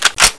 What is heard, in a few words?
ak47 clip in, weapons; czero opforce